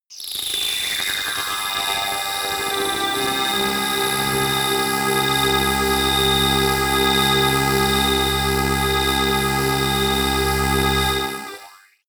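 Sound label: random noise tones, science fiction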